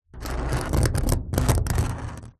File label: foley metal spike small scratch rusty metal trailer panel; zapsplat